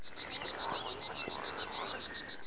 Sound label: riverwar